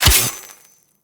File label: Melee Sword Impact Robotic Flesh